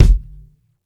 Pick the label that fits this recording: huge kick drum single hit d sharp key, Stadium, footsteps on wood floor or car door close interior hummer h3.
huge kick drum single hit d sharp key